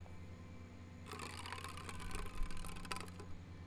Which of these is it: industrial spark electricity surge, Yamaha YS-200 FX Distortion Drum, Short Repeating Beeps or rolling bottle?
rolling bottle